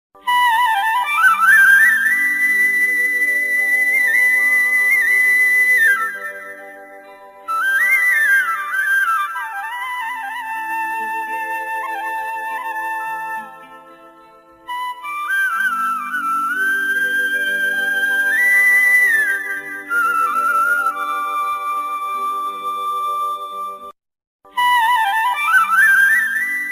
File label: best flute ringtone for mobile best basuri ringtone